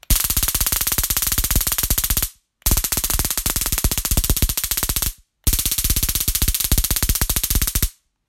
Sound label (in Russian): zvuk shokera osa; klassicheskie